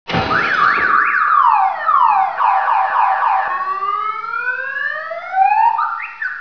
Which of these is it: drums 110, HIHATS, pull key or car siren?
car siren